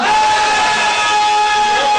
AAAAAHHHHHHHH; gachi; osu

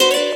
guitar

